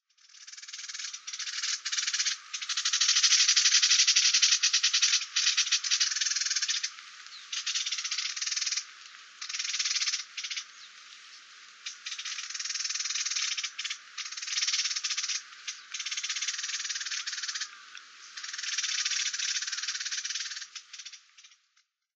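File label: Japanese bush warbler, watarase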